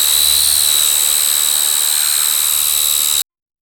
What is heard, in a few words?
a swarm of quadcopter dro, buzzing